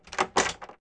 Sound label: key indr